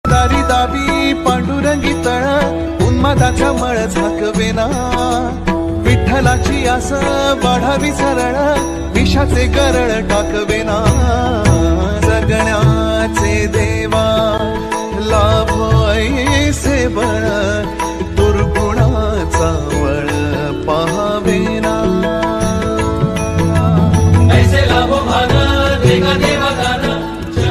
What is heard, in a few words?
devachi ringtone Marathi ringtone bhakti ringtone vitthal ringtone bhajan ringtone